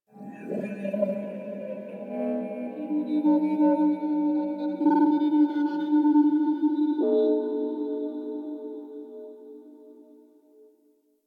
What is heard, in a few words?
Glass Texture Med, Ambience; Warframe; Cephalon Weave; DE